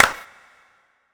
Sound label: Clap; DowntownClap